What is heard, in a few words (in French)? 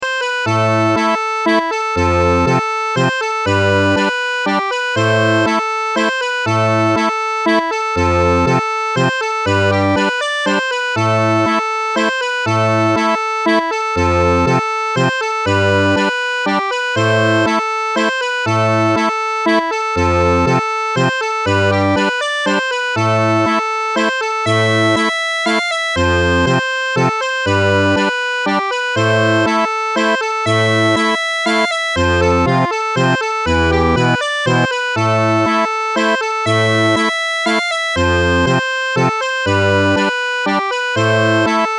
Mazurka; à Manon